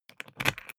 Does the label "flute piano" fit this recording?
No